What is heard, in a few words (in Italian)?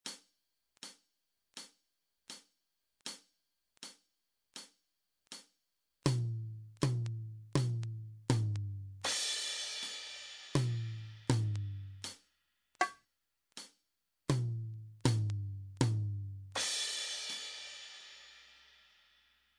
Esercizio ritmico